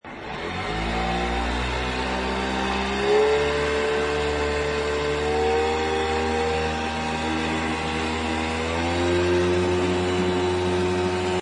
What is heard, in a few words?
running